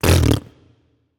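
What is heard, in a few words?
tongue, female voice; smite; voicepack; hera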